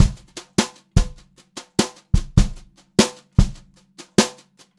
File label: JZ VARI, Brushed Drum Loops, JAZZY; USB Soundscan vol.39; AKAI